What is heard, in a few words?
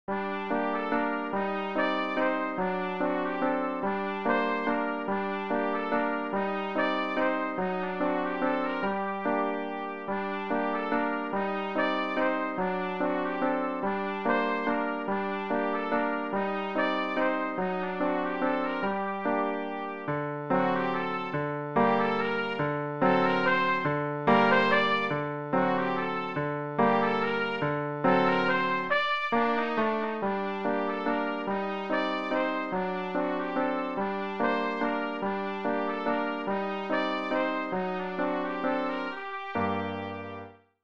le carrousel trumpet